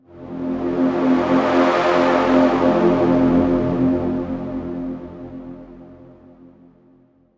Pad Loop 130 F, Techno; Loops; Pads; Ghosthack; Free Techno Sounds 2024

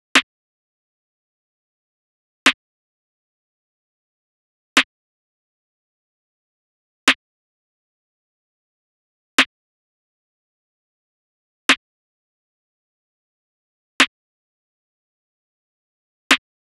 Drum Loop - 104 BPM Snare, RnB; Affection; Cymatics; ANALOG EVOLUTION Beta

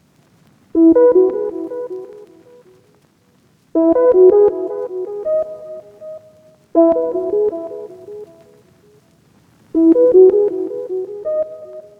lead, 80 BPM C# Min; Vortex

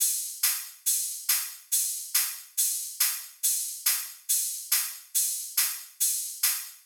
Riddim Drum Loop Top (140); Drum Loops; Ghosthack; Riddim Essentials 2020

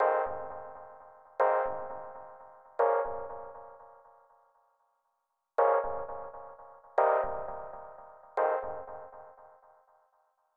Classic Melody Loop - 86 BPM F Min Keys; Cymatics; Oracle Sample Pack

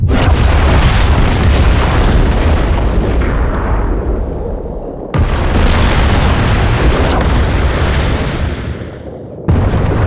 Explosion Series

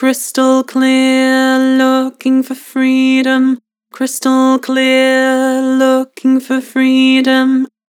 Vibrato, Vocals; Crystal clear; Harmor Vocals

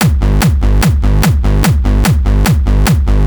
Complete Bassline; Drums & Percs; Loops

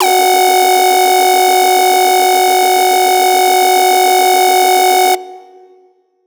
Riddim Synth Hit F# Circuit; One Shots; Ghosthack; Riddim Essentials 2020

